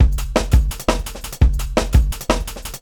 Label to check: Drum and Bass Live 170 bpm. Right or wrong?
Right